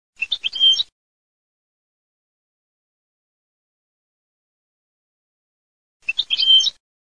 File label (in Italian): cardel completo, birdsound